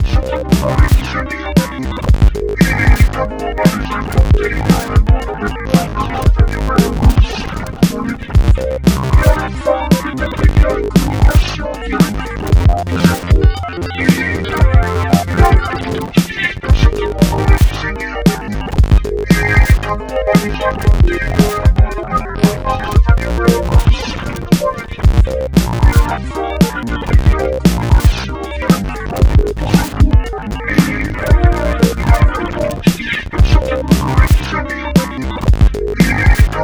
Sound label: sub boom bass; nexus